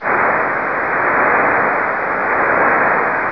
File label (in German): rausch 1800 med pulse